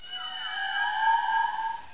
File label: Ghost